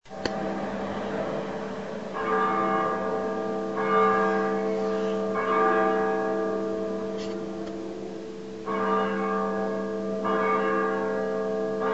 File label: easter morning bell